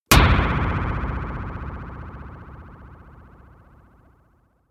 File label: sci-fi metal hit wobble long